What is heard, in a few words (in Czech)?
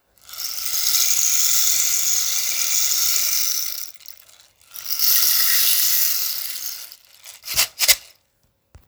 hul silna, destna kaktusova hul 50 cm silna